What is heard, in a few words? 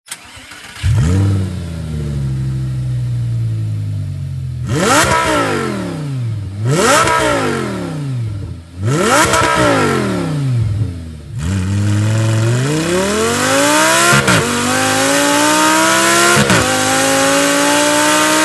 Slip On closed valve Lamborghini Gallardo